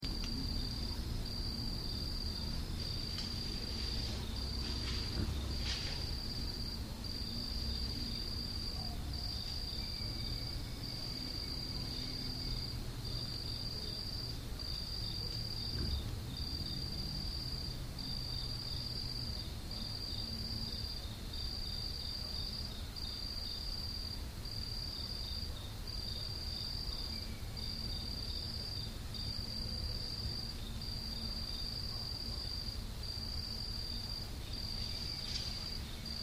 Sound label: stick snaps; Heavy stick snaps at 22:11hrs on 5-3-08